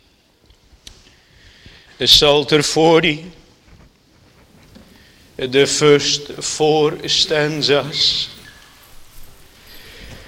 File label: Singing